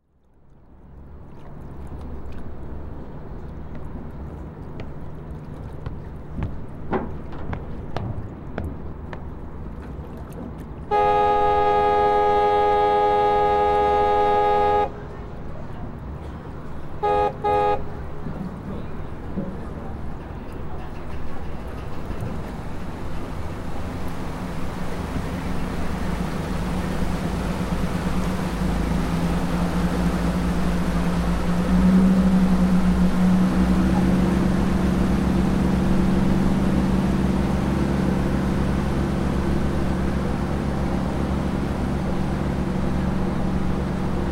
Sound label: Excursion Steamer On The Danube